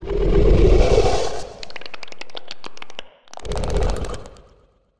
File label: Alien Passying by, Monster Sounds; The Decline Of Warriors; Monster Sounds Volume II